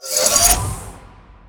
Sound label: portal button down; portal 2